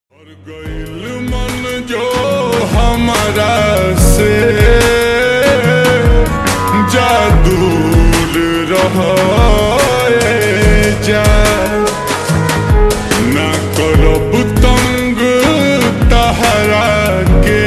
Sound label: Slowed Reverb Ringtone